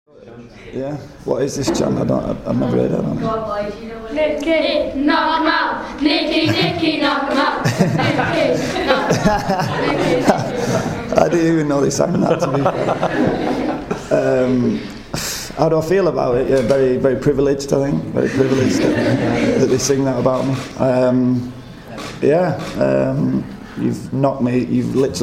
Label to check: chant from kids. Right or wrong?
Right